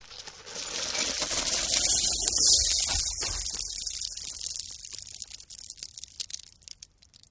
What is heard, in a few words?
Car Tires On Dirt Smoother Roll